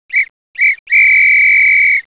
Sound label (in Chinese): 哨声; 日常生活; 特酷声音素材库